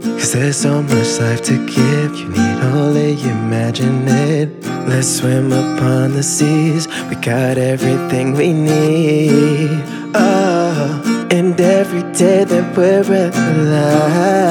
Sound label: acoustic